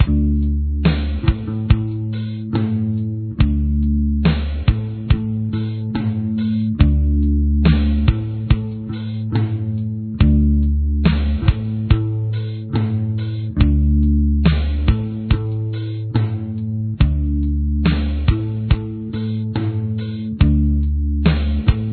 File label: bass